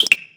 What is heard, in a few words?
Unlock, phone ui; Vivo X3S-W